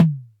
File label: Toms, Elektron MachineDrum; SPS1 MKII